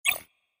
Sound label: TAP BUTTON